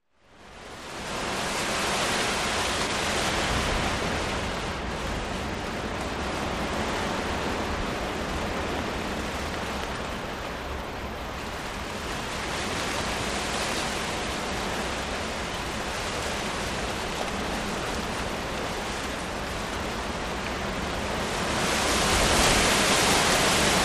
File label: Heavy Wind Close Tree, trees & gusts